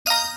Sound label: win; doublewheel